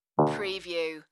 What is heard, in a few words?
Dong